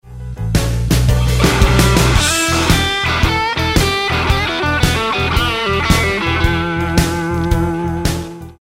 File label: Roadhouse Licks Lick, guitar; 20 Roadhouse Licks